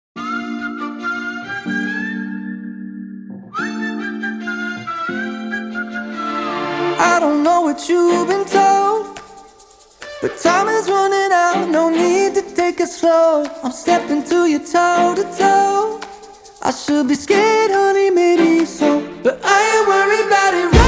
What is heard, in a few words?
string version